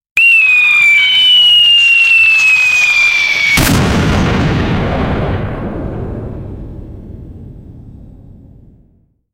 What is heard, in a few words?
stinger; rocket